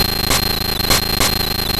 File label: Electricity; puchicon; dsi ds